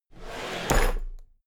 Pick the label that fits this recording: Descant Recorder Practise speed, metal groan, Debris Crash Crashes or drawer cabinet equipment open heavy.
drawer cabinet equipment open heavy